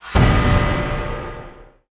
Up Worst

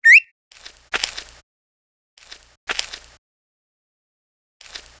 Panda Action

